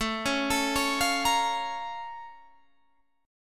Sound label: Bb Minor Major 7th